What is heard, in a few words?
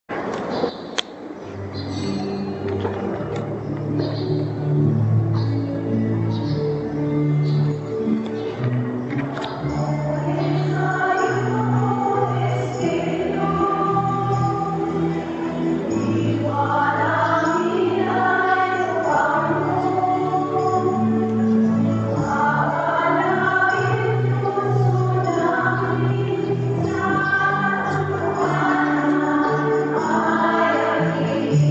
Entrance Song; Pentecoste 2011